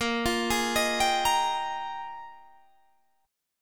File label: Bb 13th Suspended 4th; Bb13sus; arpeggiated